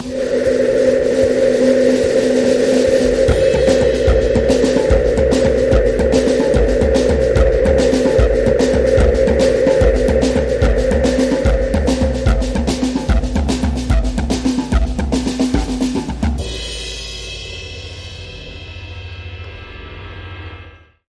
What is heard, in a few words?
Chemical Pad